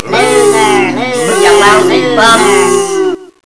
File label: boo hiss